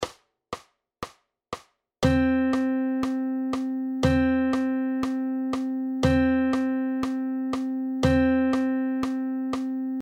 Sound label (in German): Ganze Noten